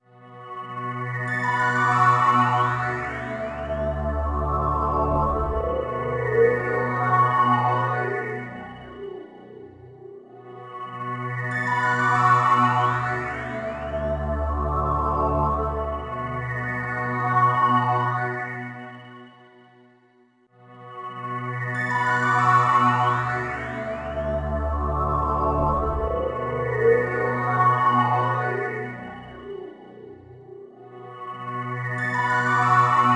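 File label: station ambience loop; RTX Red Rock; PS2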